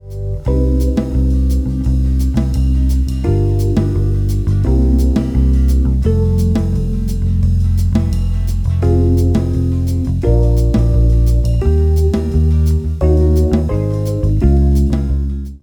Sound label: Backing Track